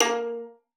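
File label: violin spiccato A#5; Xsample VOL-5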